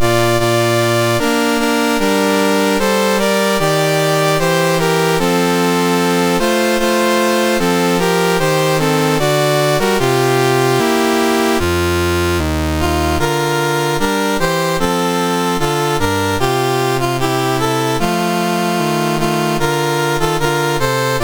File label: MOS6581R3, National Anthems; Sweden